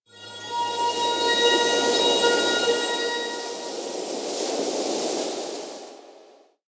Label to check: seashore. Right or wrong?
Right